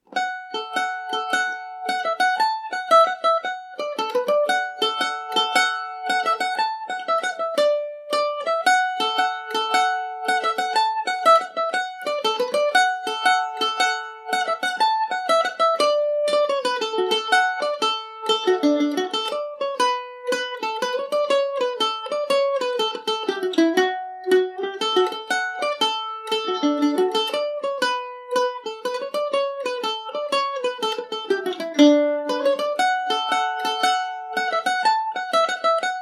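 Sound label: slide D major, Irish mandolin; adam and eve slide revisited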